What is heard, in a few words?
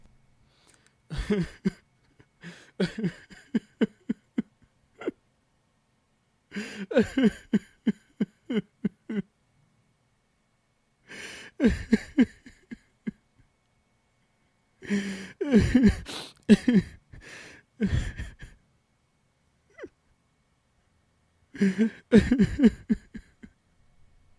Sad Male